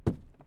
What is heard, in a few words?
Kick Wood